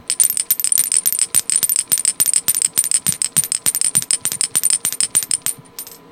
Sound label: clank